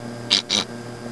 pig

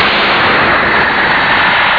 Menu

